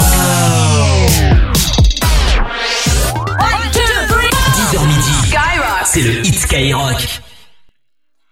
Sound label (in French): JINGLE COMPACTÉ; SKYROCK; HIT SKYROCK